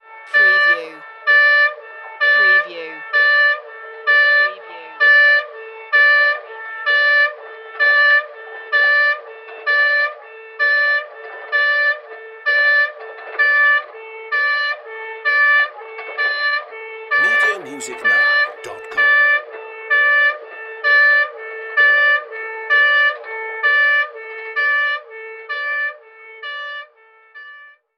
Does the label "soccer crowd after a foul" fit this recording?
No